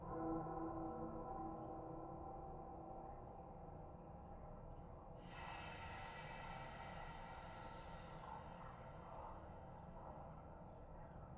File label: Ambient; the-third-place